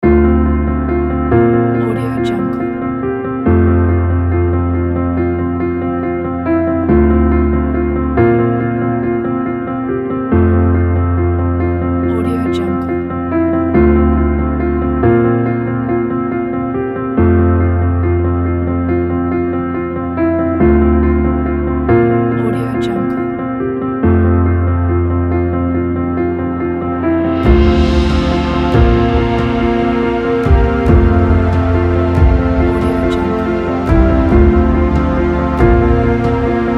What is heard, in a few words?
inspirational powerful